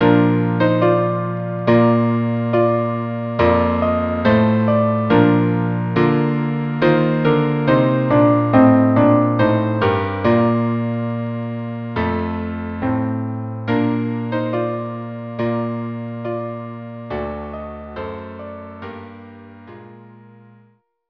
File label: string quartet; mi Bon Siach